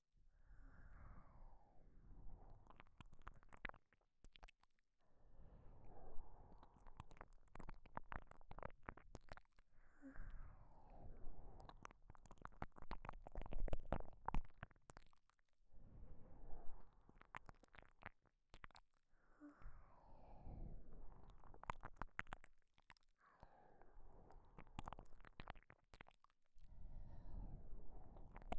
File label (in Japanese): KU100 左耳 吐息声漏れ